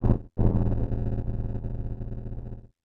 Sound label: Science Fiction Sci-Fi Electronic Static Warble